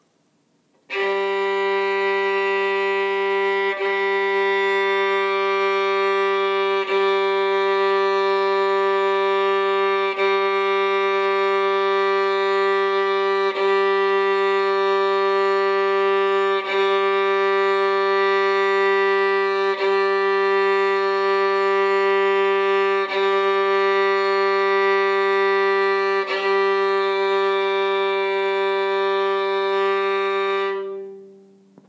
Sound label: Sol 442